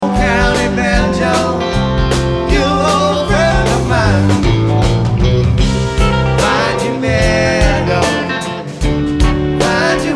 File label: Banjo